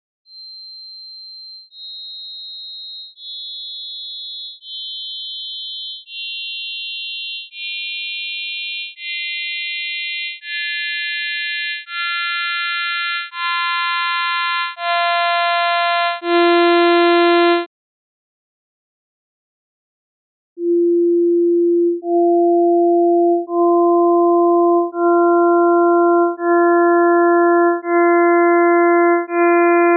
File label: Building Sawtooth by Harmonics